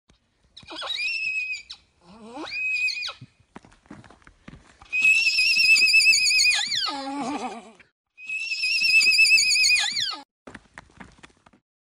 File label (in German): pony; zootiere erkennen